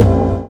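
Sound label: chord organ, Stabs, House; E Lab; XTc Files of House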